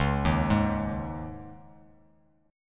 C sharp Suspended 2nd, Bass Standard; arpeggiated; x,4,1,1

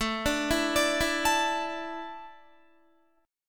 A Suspended 4th down Guitar; Asus4; arpeggiated

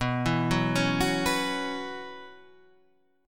B Suspended 2nd Suspended 4th; Bsus2sus4 Chord {7 7 4 6 7 7} arpeggiated

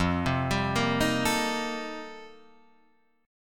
F 11th F, down, Guitar Drop D

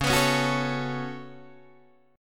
Db 7th Suspended 4th Sharp 5th Db Guitar DADGAD

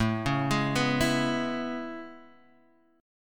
A Major 9th A, Guitar, DADGAD

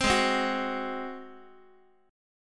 G sharp 7th G sharp, Guitar; G#7; strummed; x,x,3,6,4,5; JGuitar

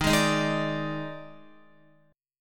Eb Major 7th Suspended 4th, Guitar; strummed; x,6,6,8,8,9; EbM7sus4

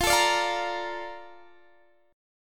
A sharp Major 7th F

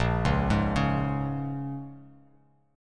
A sharp Minor 7th, Bass Standard; arpeggiated; 6,4,6,6

